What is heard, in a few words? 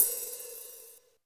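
hi-hat, drums; Best Service; Real Mega Drums VOL-1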